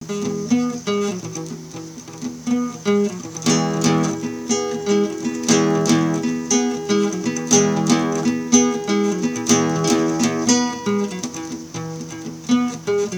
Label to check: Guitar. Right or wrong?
Right